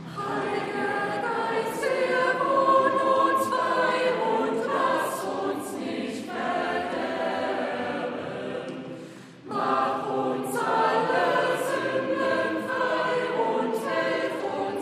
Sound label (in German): Chor